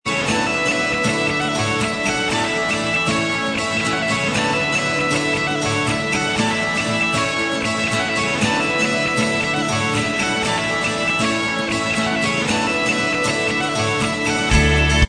Inst Track

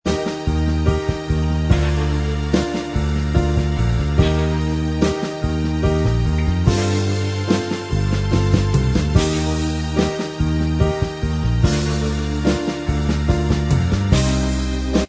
Inst Track

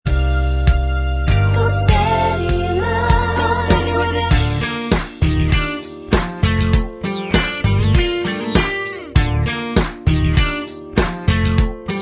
Bvox Track

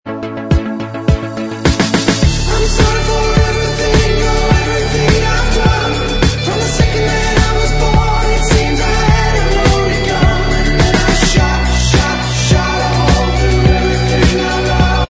Bvox

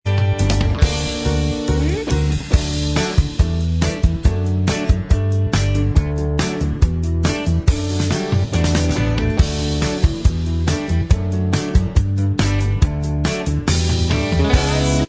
in style of Inst Track

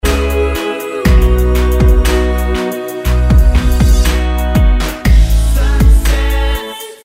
B Vox

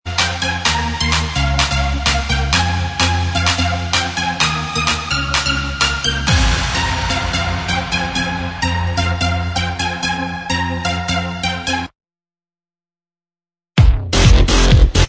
Inst

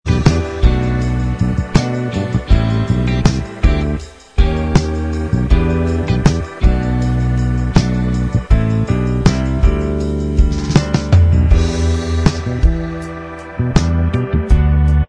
Inst

